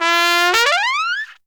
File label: TRUMPET FX; Zero-G; Phantom Horns